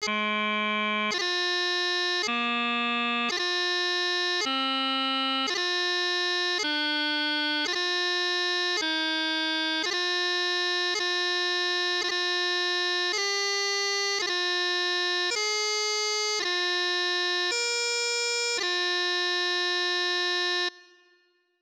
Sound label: E Doubling, piping